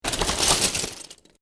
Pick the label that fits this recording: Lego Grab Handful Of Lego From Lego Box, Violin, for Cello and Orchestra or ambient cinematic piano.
Lego Grab Handful Of Lego From Lego Box